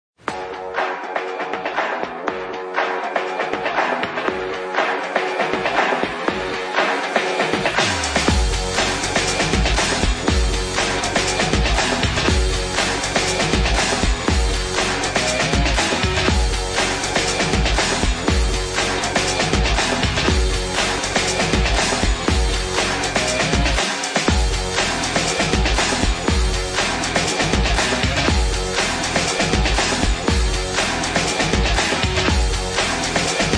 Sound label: Game BGM; OvertakingLegend